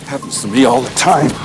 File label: beeping tone; Indiana Jones; The Last Crusade